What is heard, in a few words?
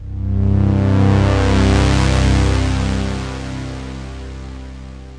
7th sweep wave, Synth